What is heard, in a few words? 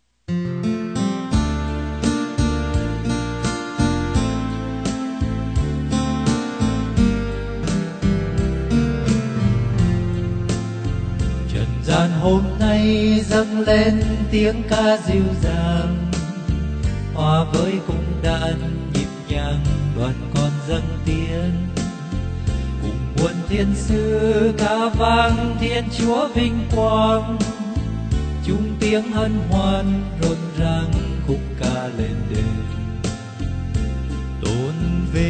BASS